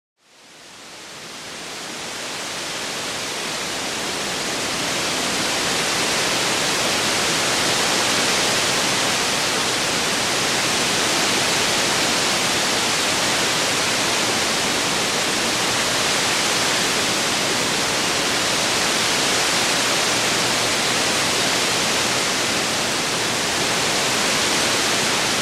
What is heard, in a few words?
rain; sodium rain keyboard weather